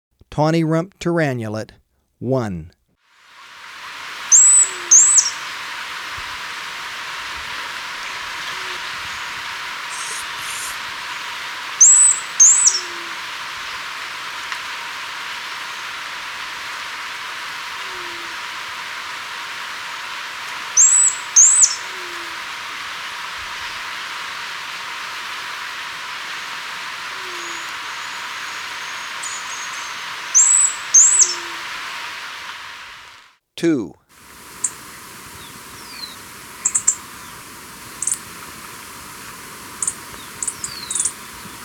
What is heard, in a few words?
Tawny-Rumped Tyrannulet; S. America